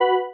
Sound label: warning